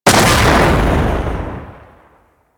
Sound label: Weak Spot Explosion; Warframe; Sargas Ruk